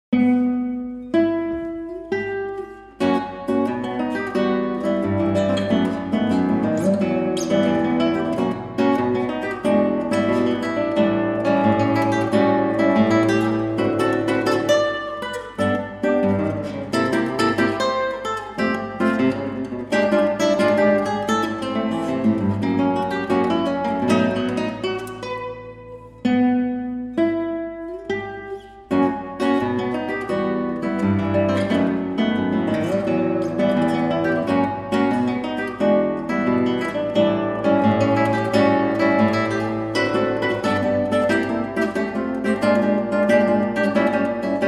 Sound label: Choros, Guitar